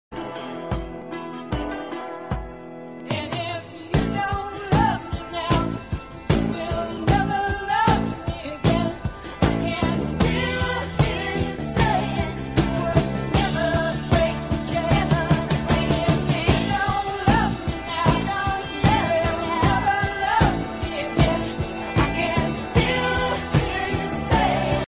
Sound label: iphone 3g s rock ringtone